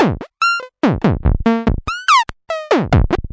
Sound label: 144 squirty gliss, drum loop; 141 to 160 bpm